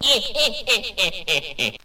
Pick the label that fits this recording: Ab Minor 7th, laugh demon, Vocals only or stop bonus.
laugh demon